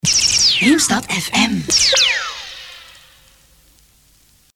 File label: laser; radiopiraten; Eemstad